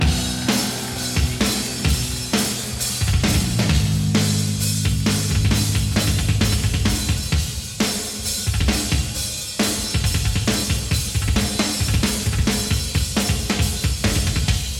Drum Solo, Live

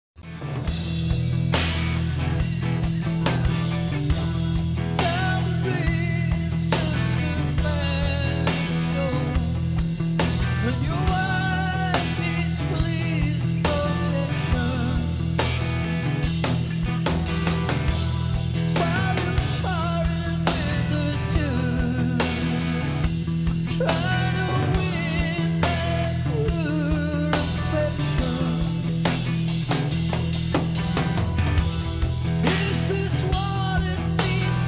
Heavy groove

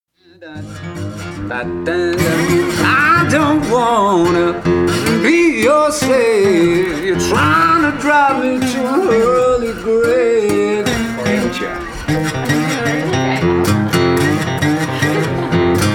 Jams

